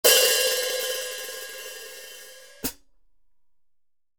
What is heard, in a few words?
13in HiHats, cymbal sound